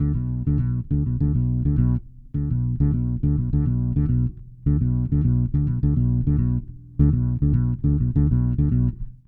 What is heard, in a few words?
Bass Hip-Hop